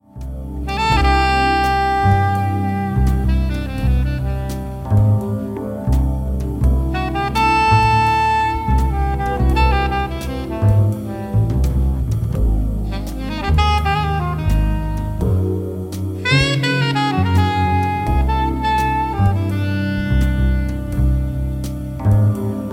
Song Alto; SAXZ HR TOM CO

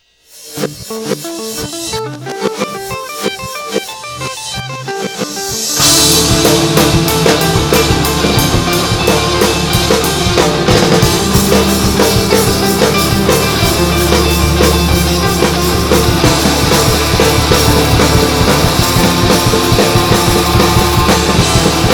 one chord

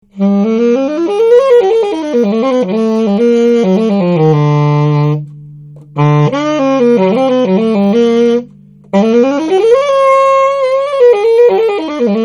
Bari Hawk 2

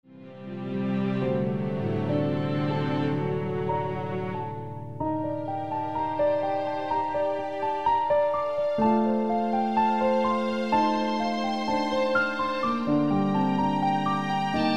piano

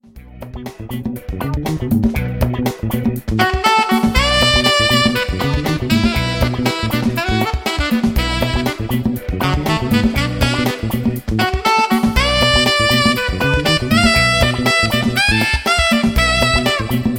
Funk Alto Sax; HR TOM CO

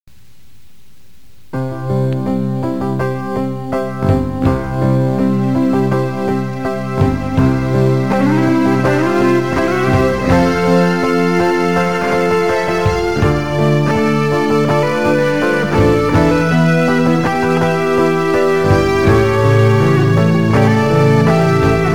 komoriuta guitar

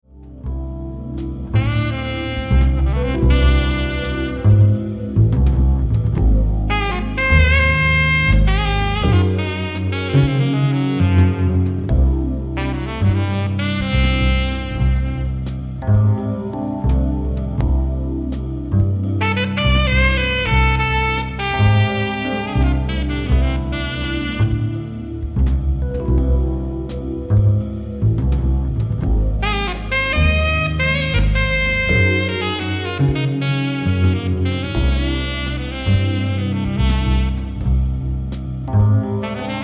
Song Alto; Saxz DSAG B1

